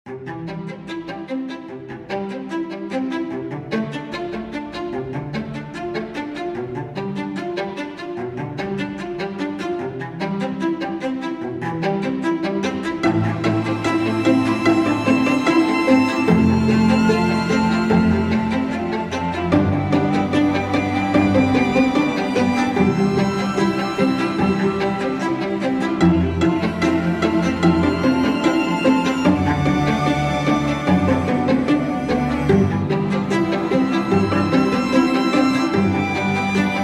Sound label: Prelude in C Major